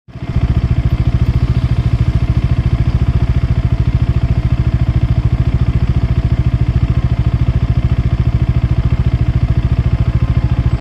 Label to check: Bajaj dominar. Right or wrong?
Right